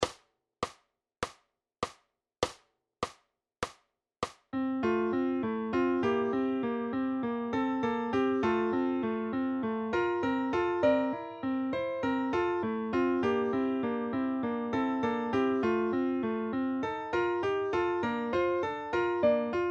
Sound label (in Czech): Etuda; Hity klasiky 2